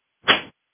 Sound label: clap syn